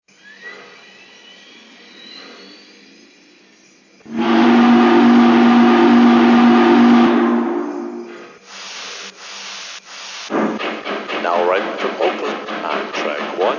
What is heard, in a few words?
484 GS4, trains